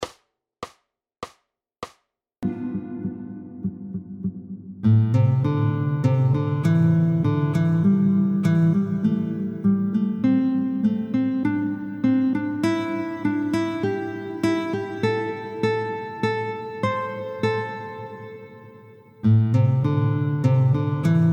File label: Guitar Gym Sequencing oefening